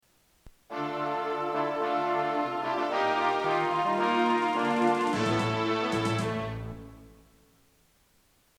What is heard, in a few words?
sample awe32